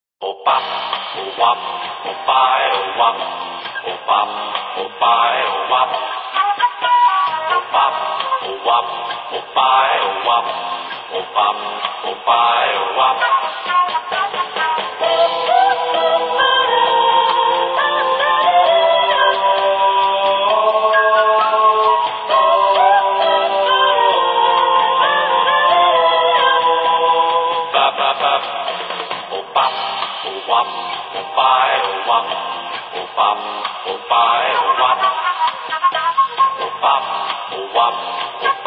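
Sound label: Music Ambient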